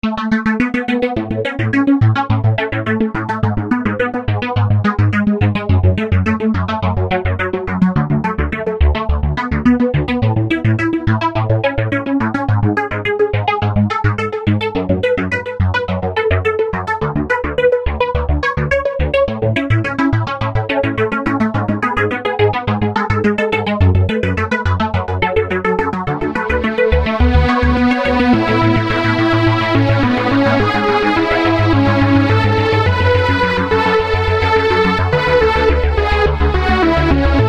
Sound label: Synth